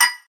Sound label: moving cursor